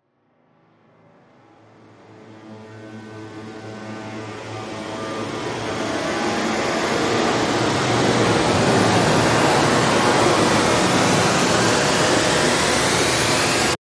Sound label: Riser Intense